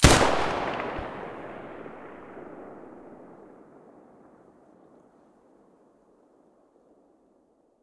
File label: muzzle mp9 mono, weapons